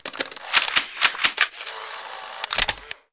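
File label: weapon jam; Wpn redglare jam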